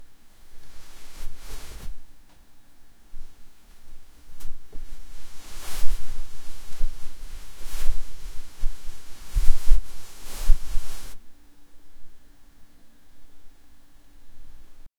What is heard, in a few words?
gently touching clothes